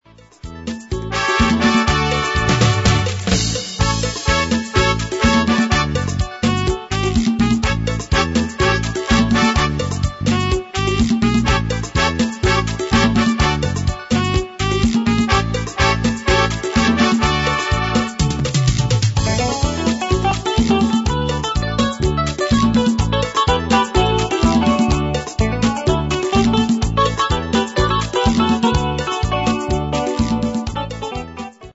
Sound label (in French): merengue